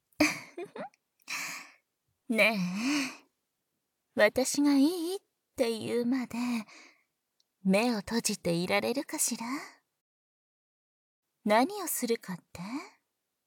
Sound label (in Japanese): 両サイドからブレイン耳舐め, バイノーラルマイク